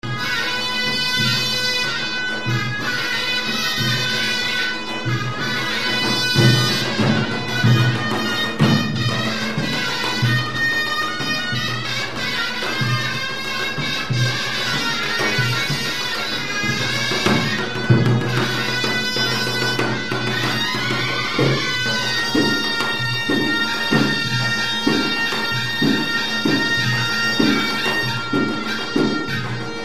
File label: el tanoura, 2 zukra, 1 tabla daladi